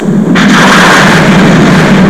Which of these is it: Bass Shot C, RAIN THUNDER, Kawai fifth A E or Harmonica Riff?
RAIN THUNDER